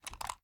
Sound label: reload empty xxmag fast tilt; gmod; iw8; uzulu